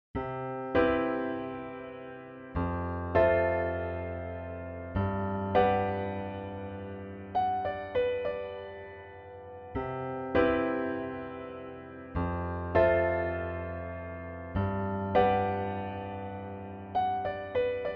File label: major 7 example, piano